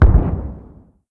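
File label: explosion distant; ModernWarfare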